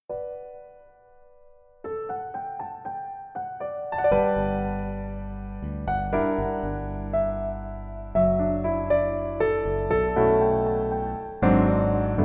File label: Piano